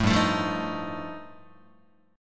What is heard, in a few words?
G 7th Suspended 2nd Sharp 5th, Guitar; G7sus2#5 strummed